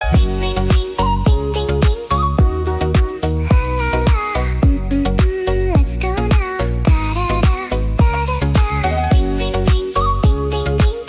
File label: ring ding boom remix berirama tenang